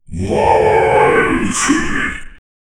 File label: demon chanting to battle